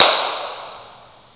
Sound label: impulse response